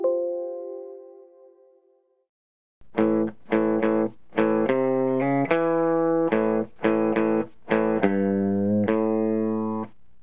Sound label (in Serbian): Gitara